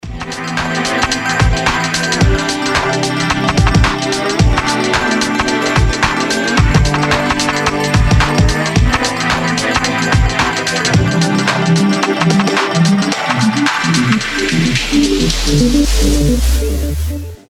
future vintage; на будильник

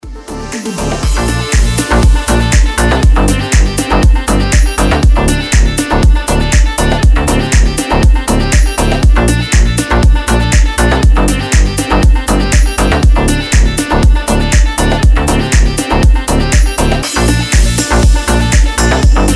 deep; deep house

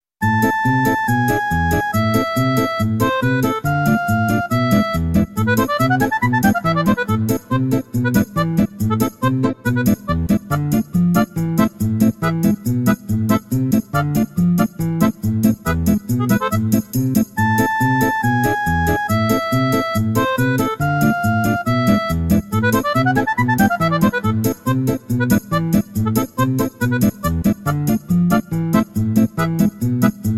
instrumental; chastushki

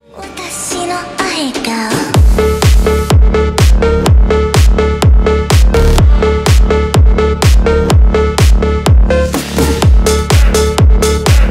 phonk

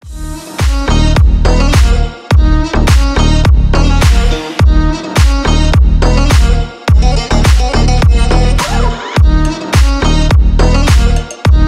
bass; tantsevalnye

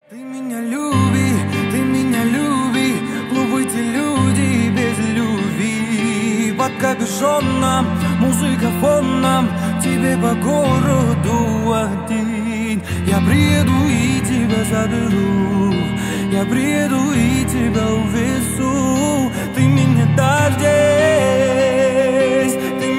acoustic version

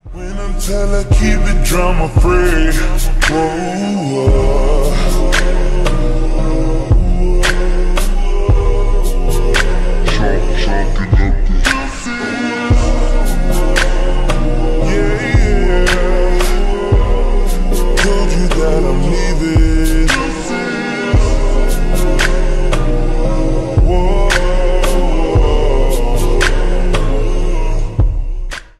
slowed reverb

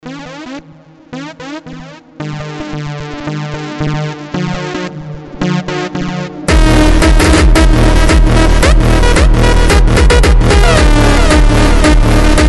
super slowed; жесткие

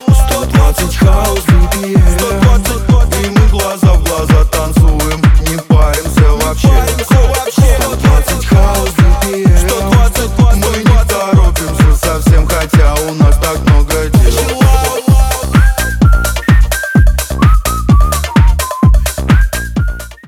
120 bpm; туса

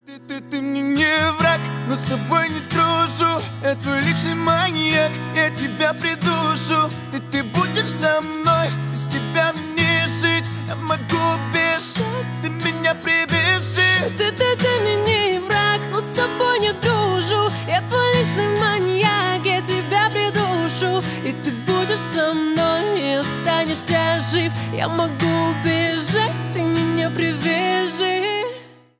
acoustic version

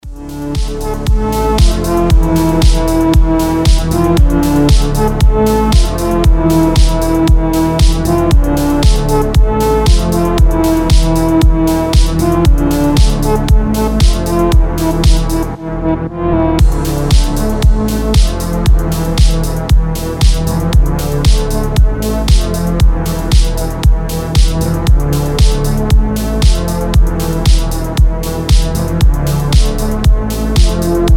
slow and reverb; модные

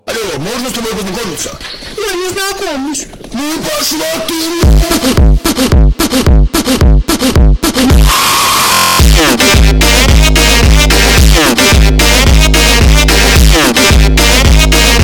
funk; фанк

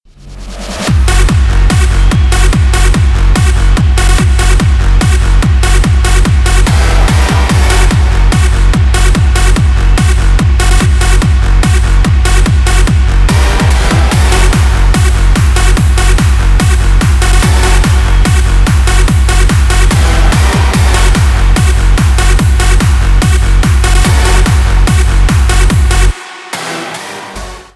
hard rave

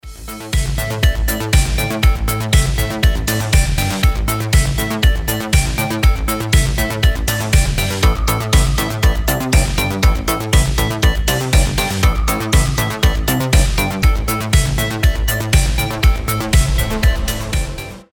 disco submarine; диско

